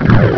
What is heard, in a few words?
RUMBLE